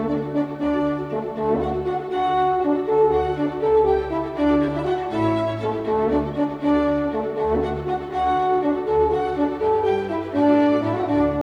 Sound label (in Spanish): trompa